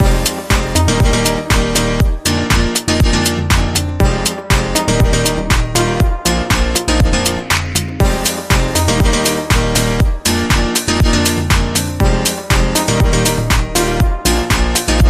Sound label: Whistleblower Flutist, Rhythm; 30sec